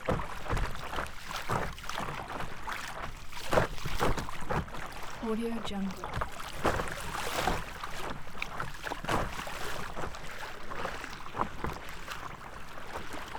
stand up paddle board floating fast

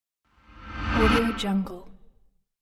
cinematic sound design

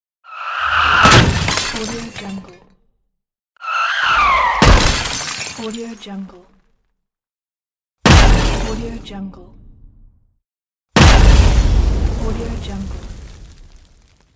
car crash

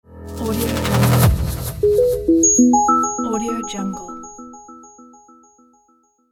marketing logo

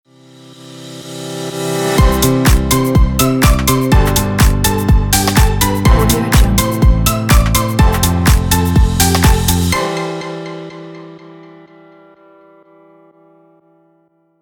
cute corporate logo

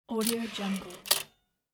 typewriter carriage slide without bell

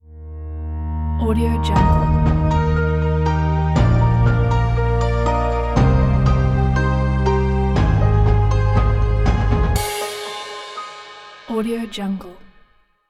opener inspire piano ident

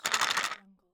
plastic vitamin tablet container shake; stormwaveaudio

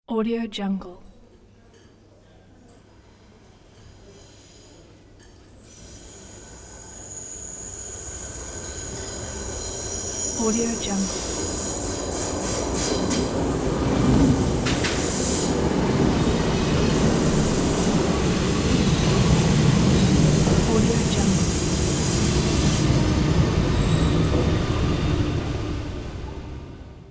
metro train pull up

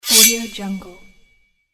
sword shing; javierpaniagua

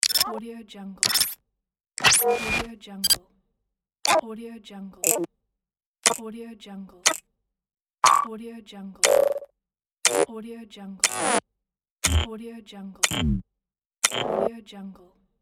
hud buttons and notifications pack; ohmlab

